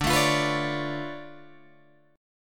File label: D Minor Major 13th, down, Guitar, DADGAD